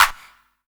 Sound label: CLAP HOU, Dance Mega Drums; Best Service